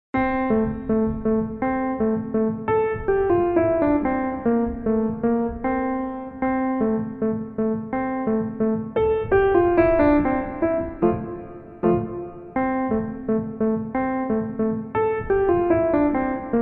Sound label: Turkish March